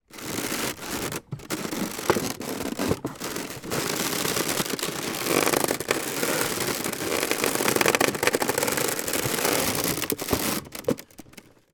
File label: foley basket wicker move stress in drawer; zapsplat